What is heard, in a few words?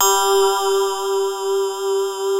DIGITAL G3; Music Store - Claudius Diemeter Schwerlos; FINE ART 1